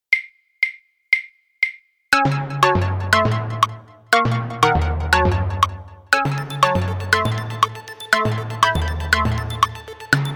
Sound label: synth click 120 bpm